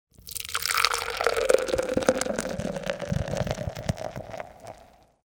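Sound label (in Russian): pouring beer from a can into a tall glass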